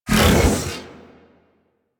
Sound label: Iron Dodge Burst; Warframe; Warframes